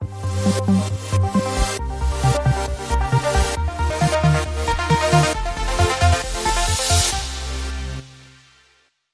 Link Sting

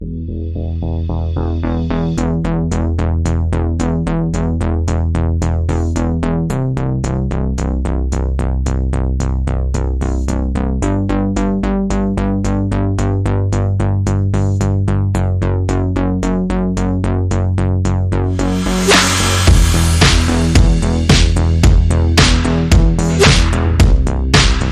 Rhythm Mix (Beats and Bass)

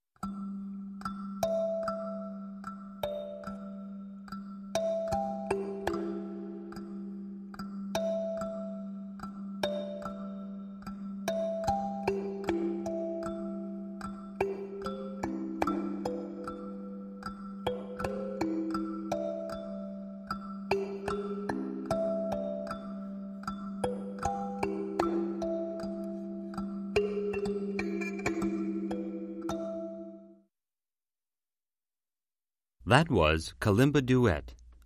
Kalimba Duet

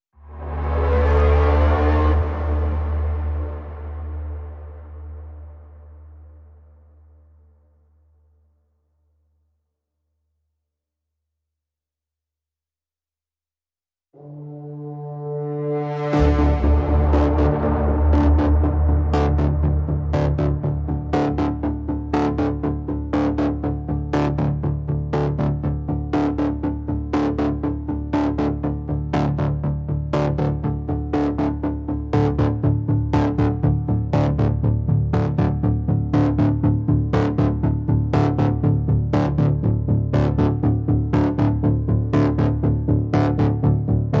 Pulse Braam

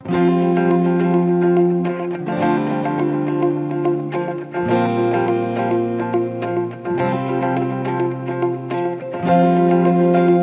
Bright Confident Beginning, No Drums

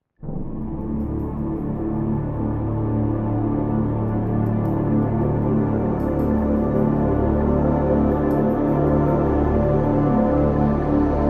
No Drums No Vocals

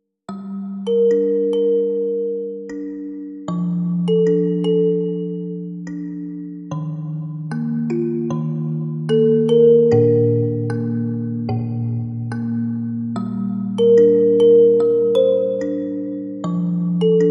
Kalimba

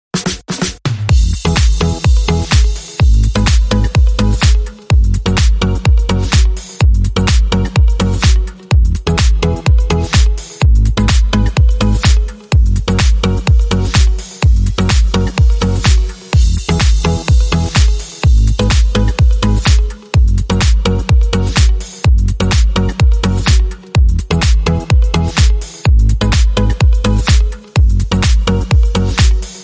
Clicking Heels

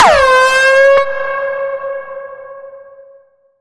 Bend, B

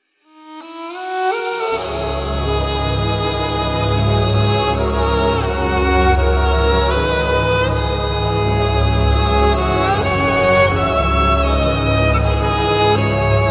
Melody With Violin

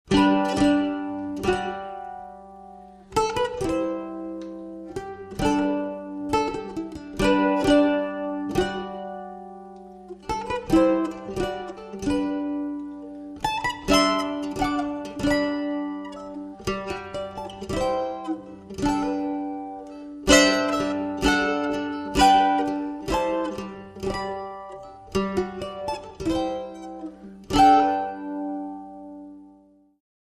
Solo Mandolin

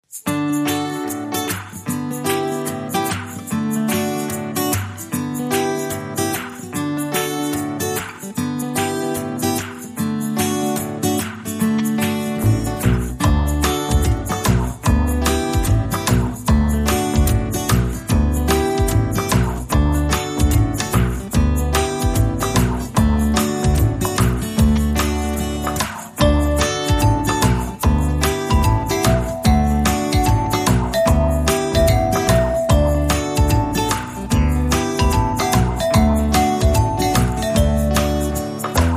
No Voice No Organ

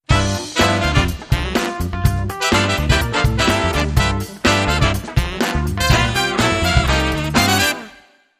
Funk Brass, Jingles

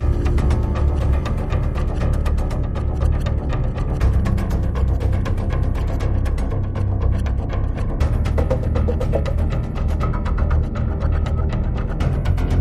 Bass Heavy

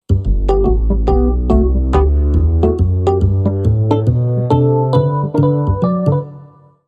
Sting

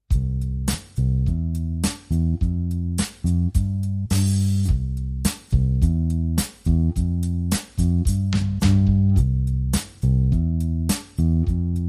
Rising Bass

